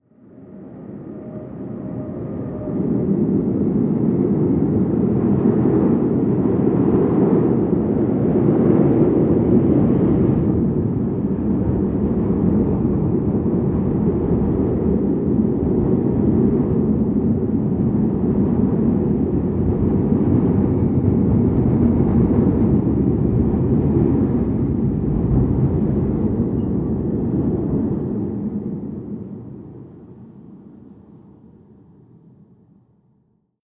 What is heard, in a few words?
train freight drive by distant